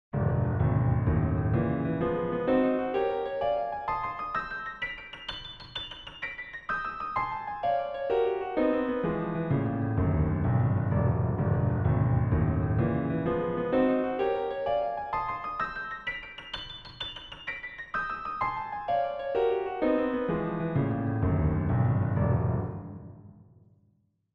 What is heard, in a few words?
scale